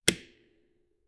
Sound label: pixel shot